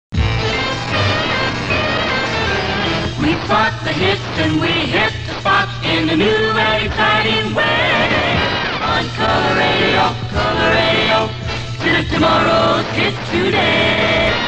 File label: Jingle; KFWB